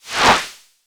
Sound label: REV SWOOSH, ANALOG PERC; Zero-G Ambient 1